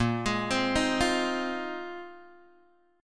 A sharp 7th Suspended 2nd Suspended 4th, Guitar, Open G